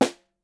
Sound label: SN, Percussion